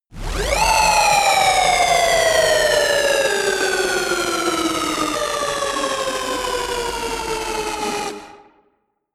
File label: glitchy space drop